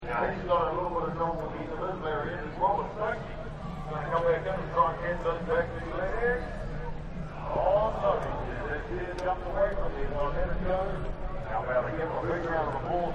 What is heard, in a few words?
Rodeo Ambience; Rodeos; Backgrounds